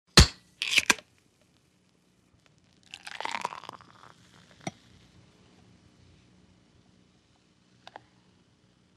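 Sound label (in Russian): открывает наливает задевает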